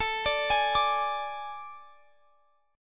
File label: A Major 7th Suspended 4th; AM7sus4; arpeggiated